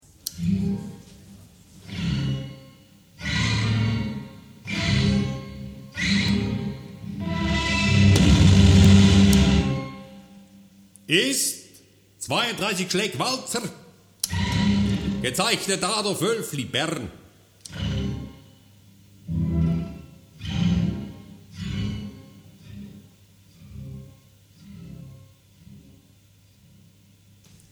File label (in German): schlaeg walzer